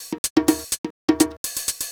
HOUSE BEATS 125

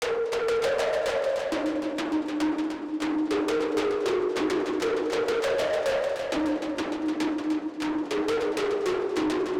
Synth magic pipes F#maj7 100bpm; Touch Loops

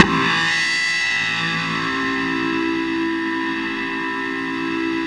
SLAPBACK; DirectWave; DreamPads

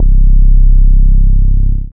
BASS MINIMOO

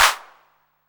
808 CLAP, TR-808

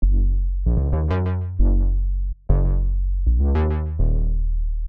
98 F# Synth Poppin; Touch Loops; LA Beat Scene MiniPack

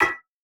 perc; Anthemic.Electro; drum hits

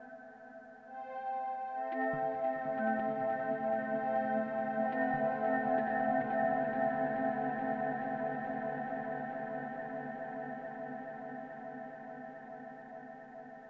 techno pad 140; Techno2; Keys

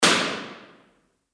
Cave - Chamber; IMP

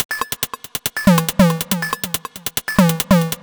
electro beats 140

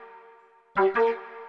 flute, ethno, 160, C

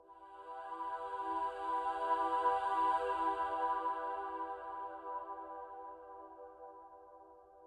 SYNTH PAD ETHNO 125 A, Sphere

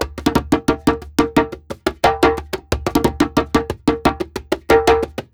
ETHNO PERCUSSION 90; ethno2

